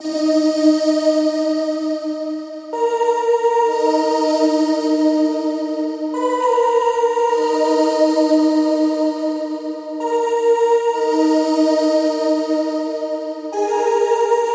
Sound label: Serum Afterparty Pad